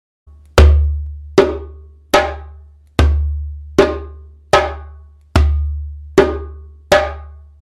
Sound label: dimba, senegal dimba 32cm